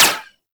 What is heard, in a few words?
Uzi Layer; Warframe; Tenno; Prime Tenno Uzi